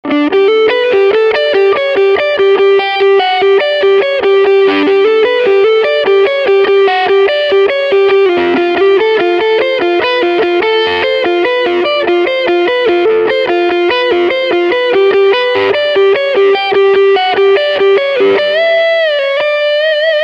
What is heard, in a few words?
canterbury lead